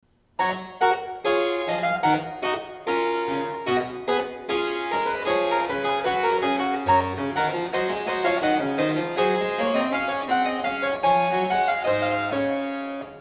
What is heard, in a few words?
Gavotte, harp; Bach